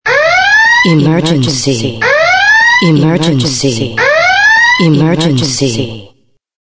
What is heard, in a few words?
emergency